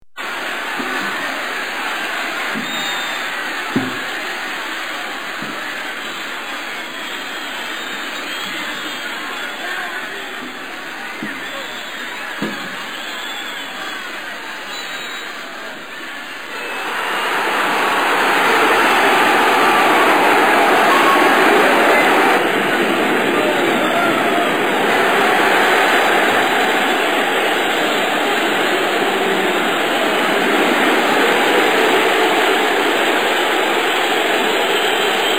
soundtrack

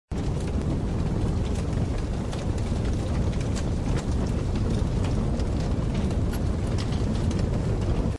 fireball

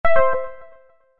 learning wrong

